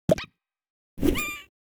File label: Grab Throw; Membre de l'equipage; deep dive repair game design